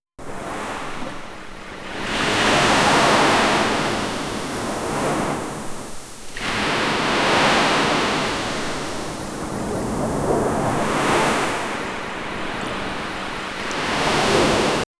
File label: sea waves sound; crashing